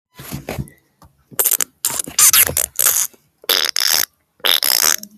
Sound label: Light Fart